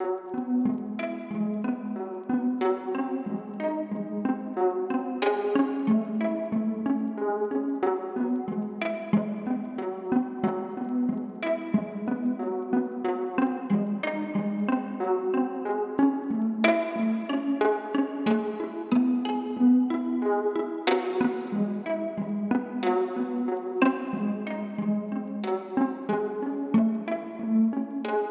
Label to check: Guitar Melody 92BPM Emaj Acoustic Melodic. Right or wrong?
Right